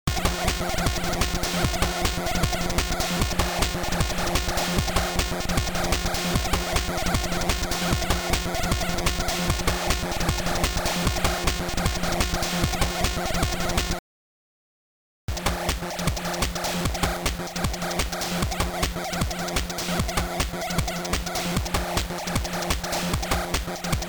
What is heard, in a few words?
carter game boy vs stock normal game boy